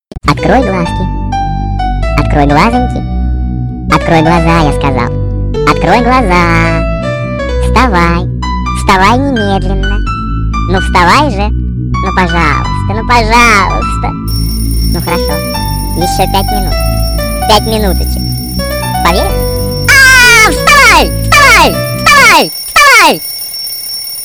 Ласковый будильник

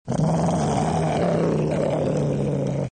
Звук Рычания Собаки

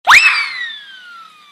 Мультяшный Звук

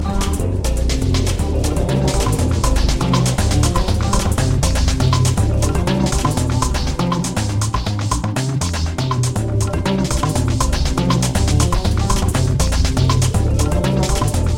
Джазовая Мелодия

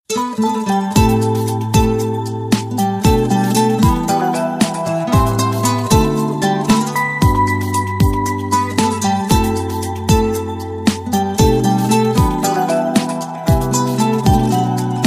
Красивый рингтон на SMS

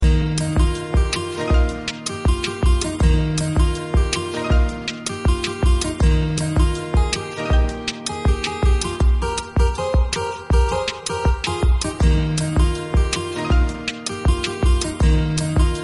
Мелодия Гитары

